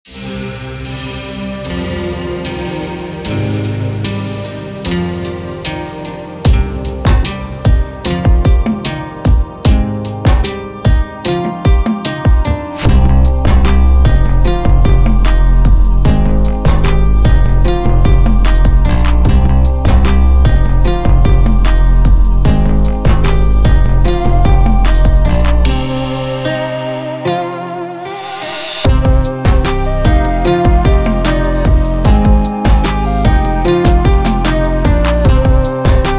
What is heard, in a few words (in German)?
ganzer beat; Plugins für Beats, Workflow & Feinschliff